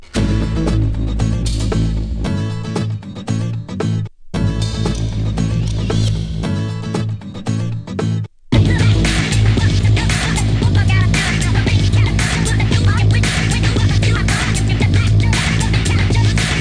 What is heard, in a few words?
boss music